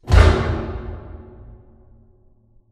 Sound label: Plunger Rise Start; Titanfall 2; Boomtown